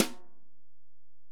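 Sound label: Acoustic Snare, Alesis DM-PRO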